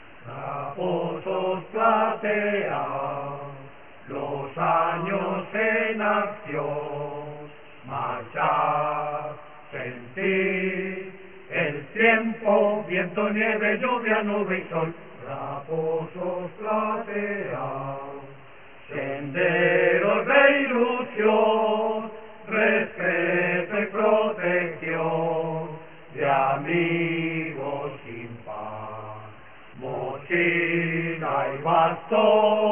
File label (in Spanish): Himno. Coro